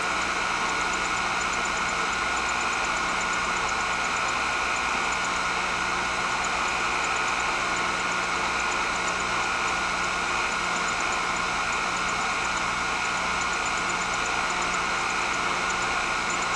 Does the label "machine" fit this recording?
Yes